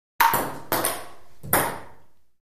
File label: Ping Pong Ball Hit